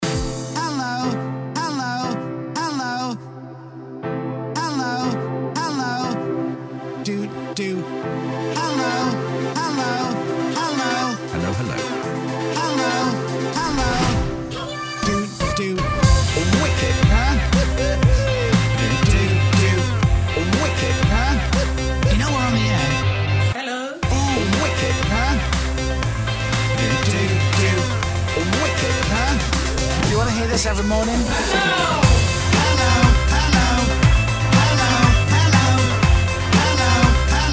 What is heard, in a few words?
Pop Rock mix